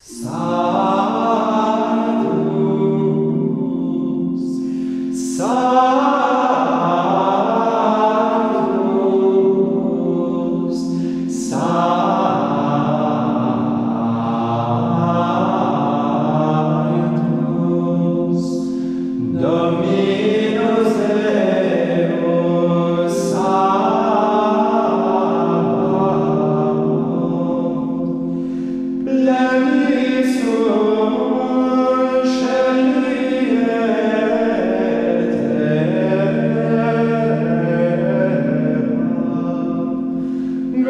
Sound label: Sanctus VII; Schola Bellarmina France